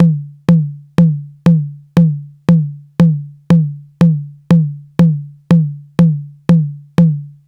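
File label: hi tom, saturated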